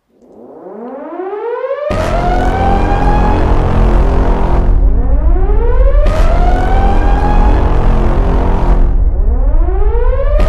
Alarm

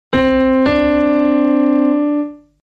Piano C and D

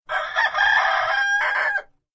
rooster call; animals

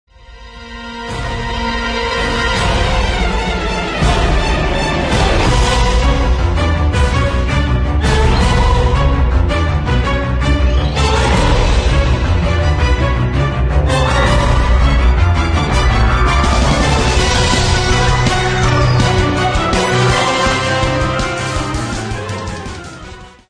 battle theme orchestral

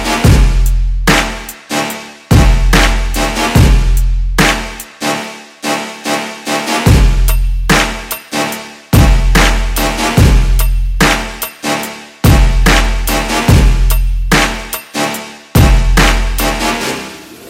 Trap Tune

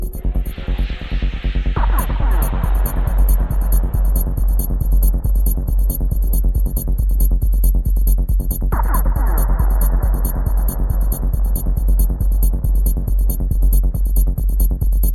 Low Sound Alarm

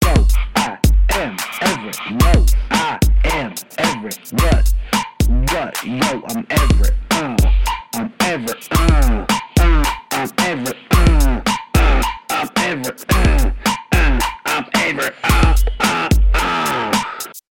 Theme Song

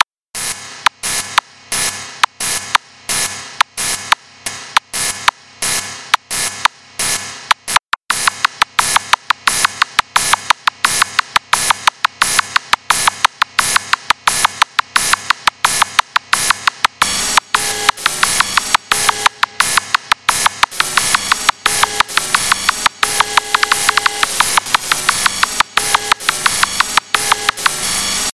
Combo Beat

